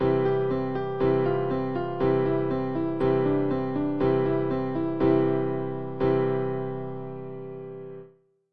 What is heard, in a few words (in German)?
Akkorde, klavier